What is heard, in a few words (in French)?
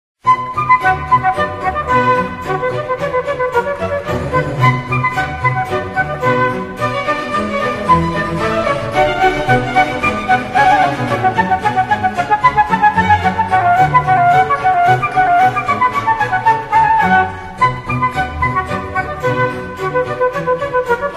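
Suite pour orchestre; Badinerie; Bach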